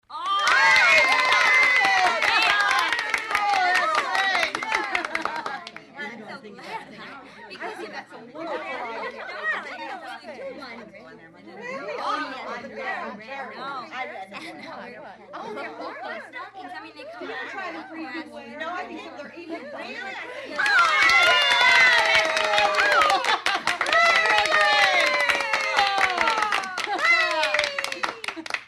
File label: 10 Women Clap Cheerin